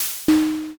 petrify

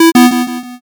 error

